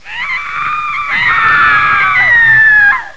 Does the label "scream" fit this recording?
Yes